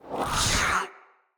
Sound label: Staff Caster Cast Long; Weapons; Player; Staves; Wazzard Staff Caster